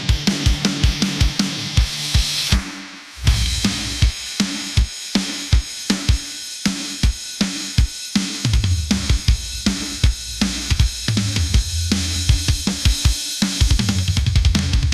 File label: power surge anthem; ai